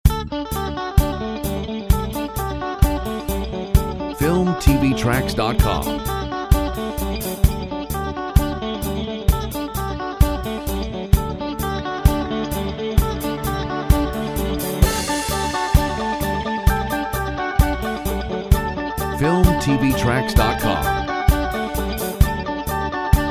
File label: Rock Stellar